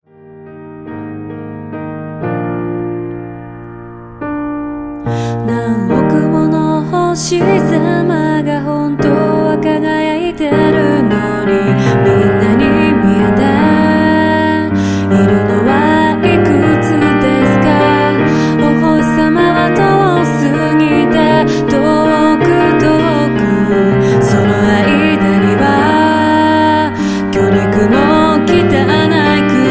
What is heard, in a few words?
music